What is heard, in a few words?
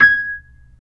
B-3 Percussion; Roland; Keyboards of The 60's and 70's